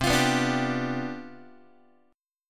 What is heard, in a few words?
C Major 7th Suspended 2nd Suspended 4th, C, down, Guitar; strummed; CM7sus2sus4; x,8,8,9,6,6